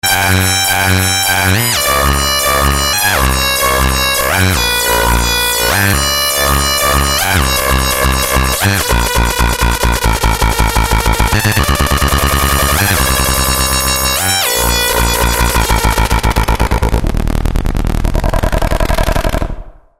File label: Plan-B Model 25